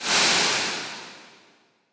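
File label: Dungeon; Ryusa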